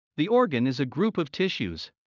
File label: Heart fast; Classroom humanbody